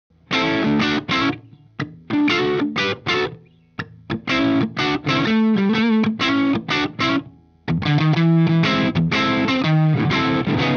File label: FUZZ